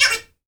TOY, TOYS PERC; AKAI S-Series CD-ROM Sound Library VOL-5

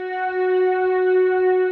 HOUSY, RAVE; Rubber Chicken Software Sample Bank

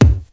RAVE KICK; Crypton - DrumBase5000

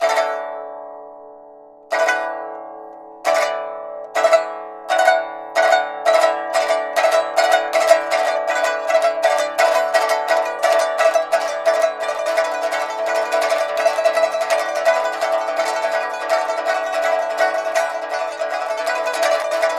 PIPA HORSE; INIS - Opium; PIPA MAGIC

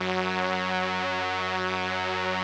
505, String Machines

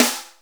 Snare, Akai MPC500

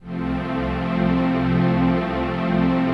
TRANCPAD; Best Service; XX Large Pads; Sample CDs

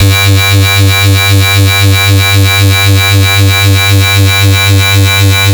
FS (G2), Synth; neuro; Stanza; FightSynth Multi

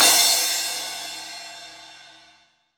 crash; East Collexion; Drum 1 Dry

